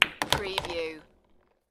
Billiard, Pool, Snooker - Ball hits other ball which falls in pocket